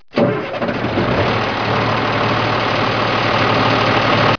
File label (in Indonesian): suara mobil